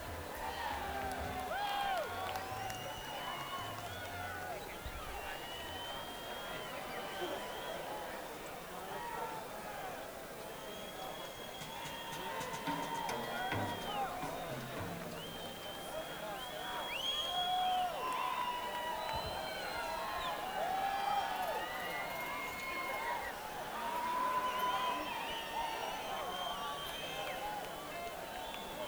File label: Crowd